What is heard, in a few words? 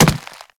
Infested Runner Step Default; Warframe